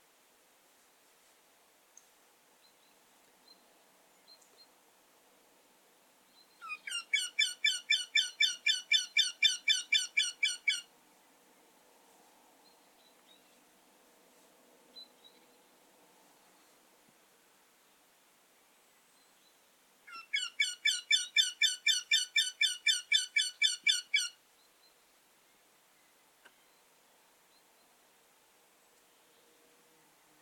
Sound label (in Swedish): goktyta; fågelljud